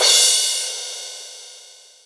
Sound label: Crash; PLUTO KIT